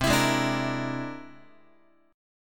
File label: C Augmented 9th, Guitar; strummed; JGuitar